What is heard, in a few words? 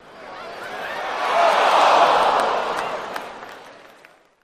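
Crowd Cheer Ooohh Outdoor, baseball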